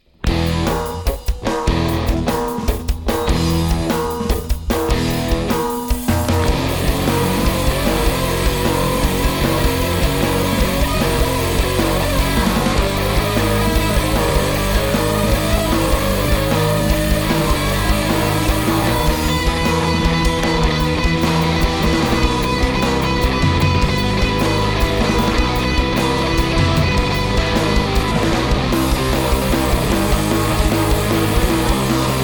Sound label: Hardrock